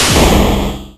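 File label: Battle Boss skill; Etrian Odyssey III HD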